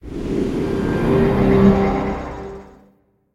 time back; escape from frankensteins tower

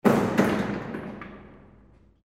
Hinged closing

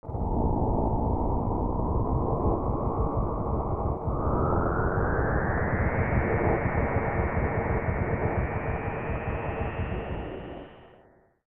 Unknown Ambience

